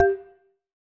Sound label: volume slider; Roblox